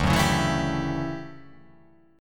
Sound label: D Major 11th D down